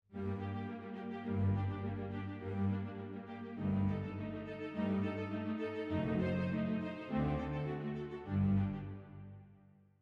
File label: ORCH REDUC piano; Mozart; Symph40 K550; OutofContext